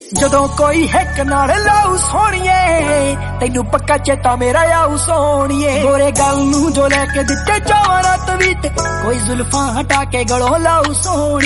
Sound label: Punjabi